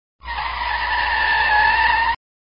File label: Car Tire Screech Sound Effect